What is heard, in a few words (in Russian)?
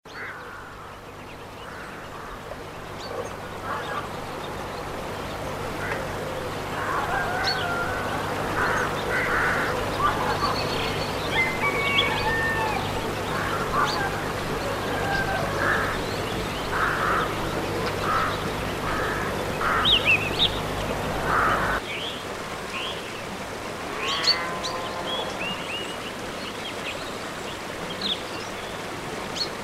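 рассвет в деревне; ZVUKI PRIRODI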